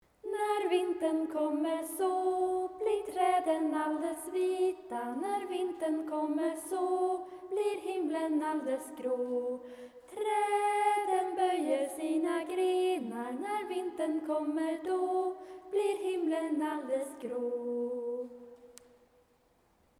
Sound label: voc